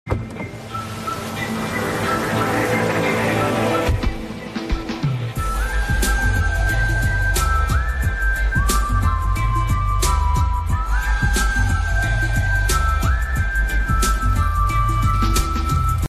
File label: Whistle Ringtone